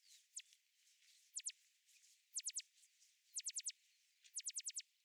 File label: DRONES